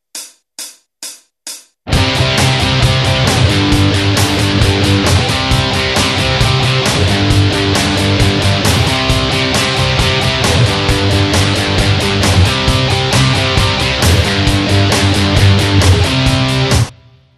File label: scratch